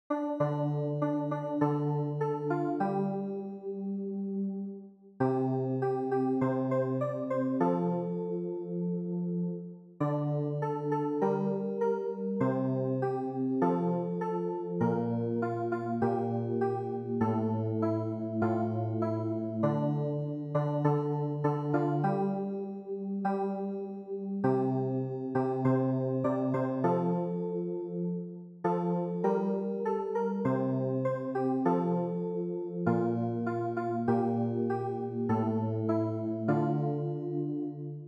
CLAVIER

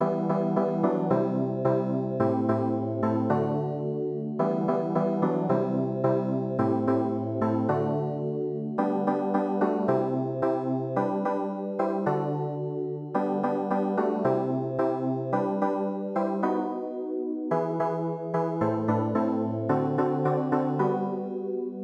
CLAVIER

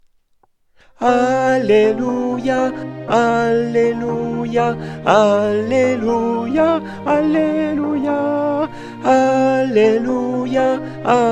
TENOR; ALLELUIA colombiere

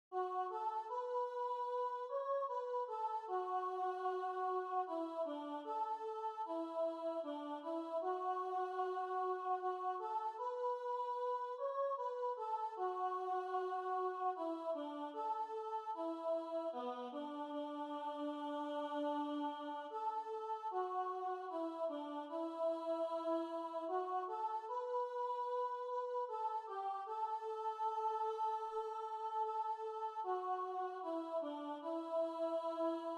CHANT

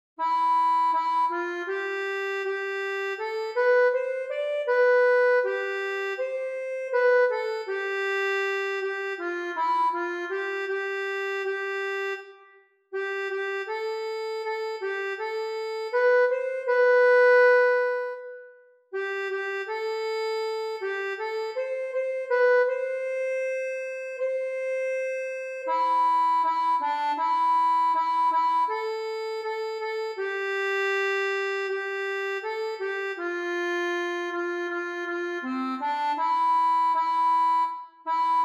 ACCORDEON

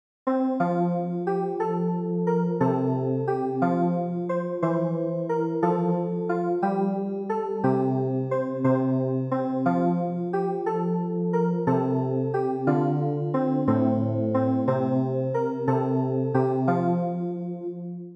CLAVIER; Kantik Sant Yan

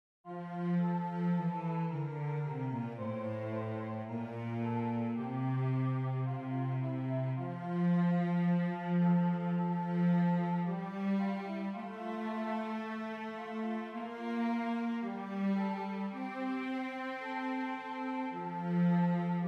VIOLONCELLE; Psaume127

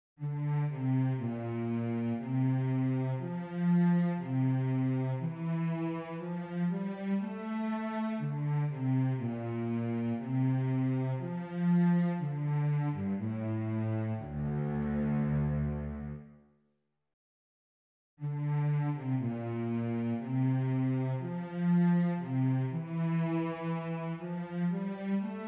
SANCTUS VIOLONCELLE; St Jean

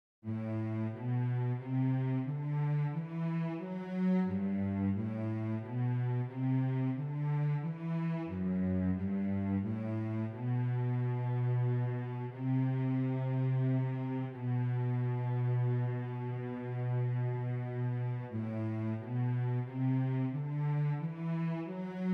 VIOLONCELLE

